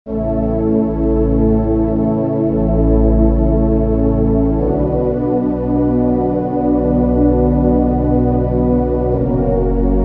pad, roland mdc1

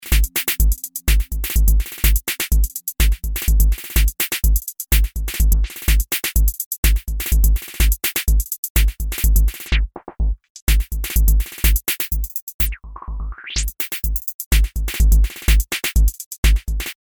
drum; Emu XL1 Xtreme Lead

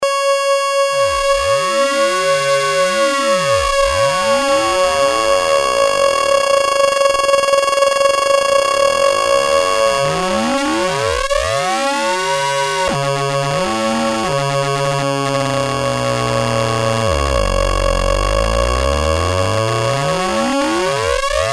ring modulator

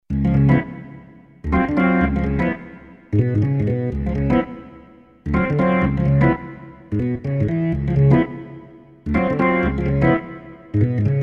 organ B3, Roland JV2080

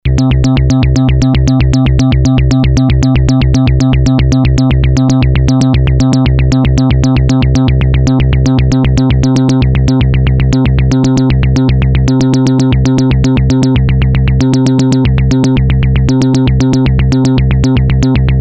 test arpeggiator, novation bass station 2; TEST: arpeggiator patterns; analog monophonic machine with subtractive synthesis

